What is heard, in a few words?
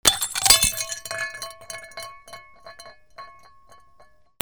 BREAKING